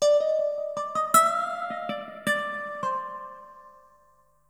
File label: ELECGUIT, AMBNT, 160, C; Ambient; Guitar